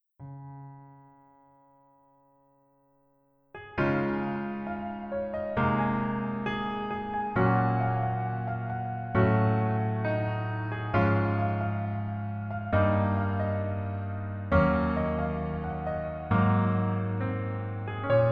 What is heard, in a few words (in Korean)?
Piano ver 멜로디MR